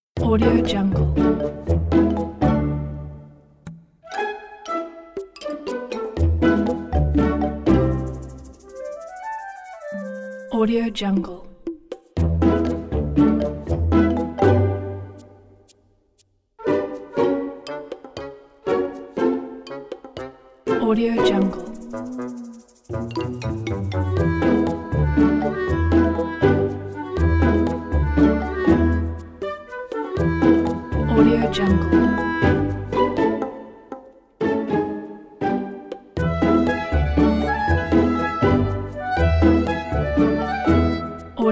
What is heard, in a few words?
sneaky funny comedy